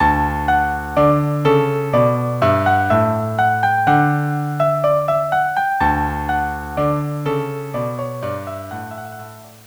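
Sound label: March D violin cello; jacquet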